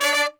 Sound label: Horns